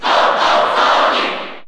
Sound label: Cheer Japanese; Sonic; SSBU